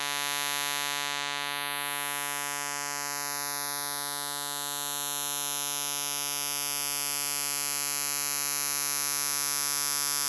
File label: sweep ear signals; enzner2008